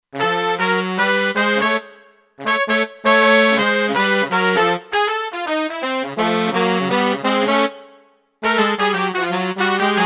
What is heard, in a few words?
Hip Brass, Kurzweil K2500X; Factory Programs